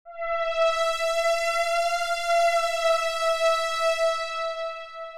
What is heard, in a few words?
Lead; Sierra; Leftover